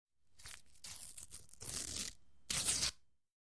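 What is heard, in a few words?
Athletic Tape Stretch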